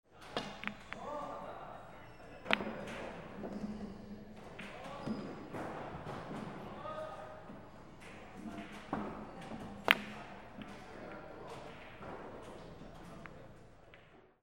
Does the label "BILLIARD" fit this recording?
Yes